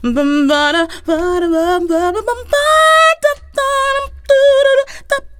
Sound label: FEMALE VOX, Hip Hop; E-MU Formula 4000 Series Vol. 1; Hip Hop Nation; Phatt Phemmes 4